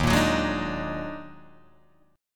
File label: D sharp Minor Major 11th, Guitar, Open G